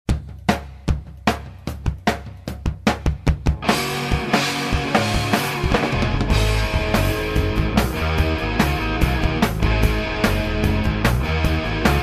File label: rock and roll